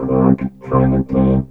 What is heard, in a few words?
Korg, FX